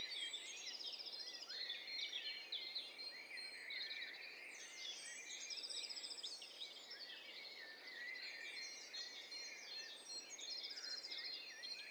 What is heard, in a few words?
STEREO BIRD COCKTAIL PARTY